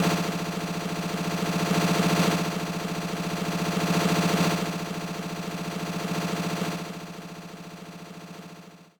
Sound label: Drumroll; Magical Quest Starring Mickey and Minnie; GBA